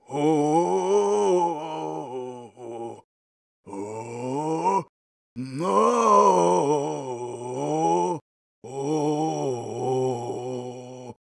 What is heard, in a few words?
sing